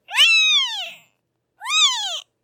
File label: baby cat